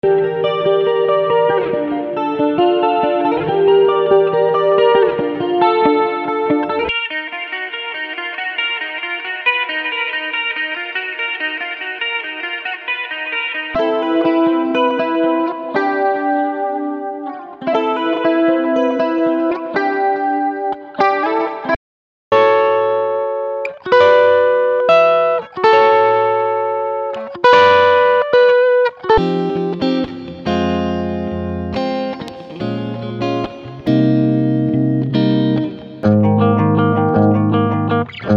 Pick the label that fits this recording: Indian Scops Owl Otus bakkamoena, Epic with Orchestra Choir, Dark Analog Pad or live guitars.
live guitars